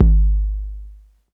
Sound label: Bass Drum Sub Short; Lost Tapes From Mars